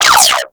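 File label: Gatling Gun Projectile By; Warframe; Tau Gatling Gun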